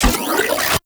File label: Charge Up; Crp BFG Vandal